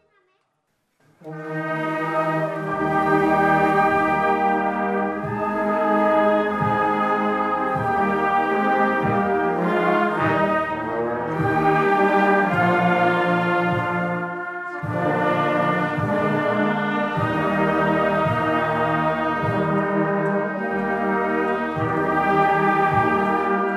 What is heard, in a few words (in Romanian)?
Fanfara